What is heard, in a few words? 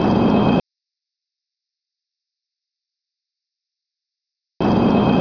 concrete scrape